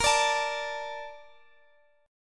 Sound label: A sharp Major 7th, Guitar Drop D